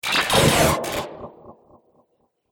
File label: Laser Future Droid Repair; Laser Sound; Sci-Fi Laser; Andrew Fleming